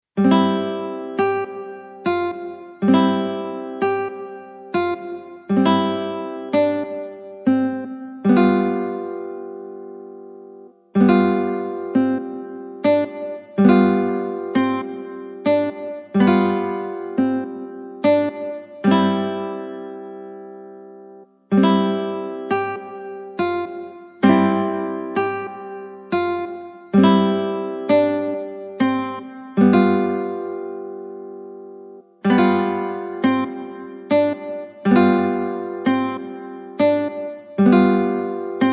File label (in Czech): Maly menuet